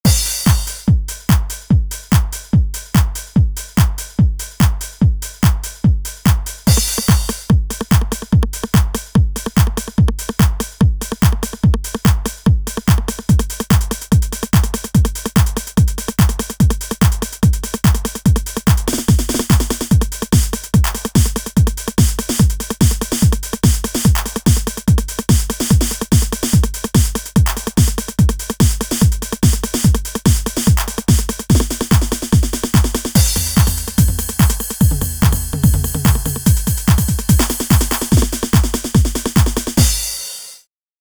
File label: d909 kit; superwave